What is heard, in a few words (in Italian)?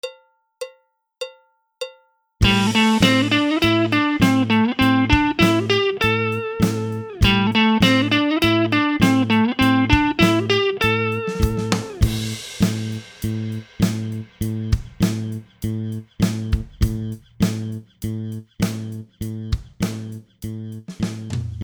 Esercizio, guitar